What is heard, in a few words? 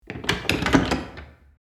Large household door latch lock; locks keys